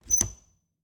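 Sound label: Refrigerator, old fashioned, wooden, steel, brass clasps, hinges, open; Eiravaein Works; Vaeyan IV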